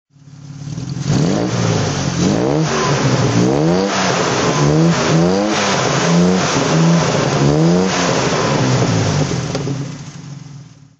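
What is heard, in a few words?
jaguar xk150 dhc throttle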